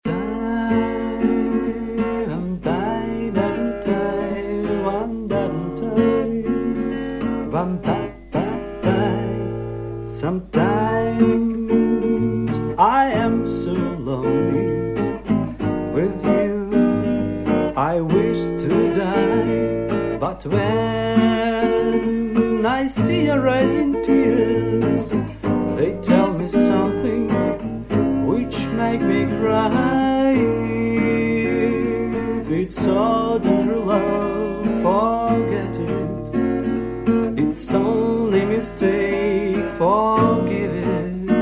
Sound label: acoustic version